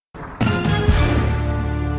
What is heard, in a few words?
short sound before fatality; mortal kombat 9